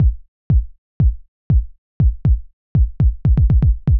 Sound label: Kick Hard; hydrogen